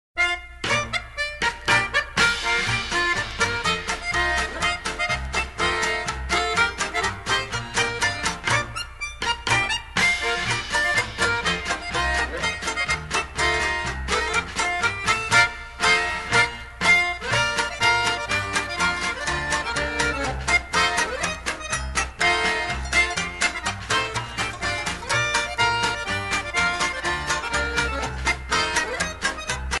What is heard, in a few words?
Polka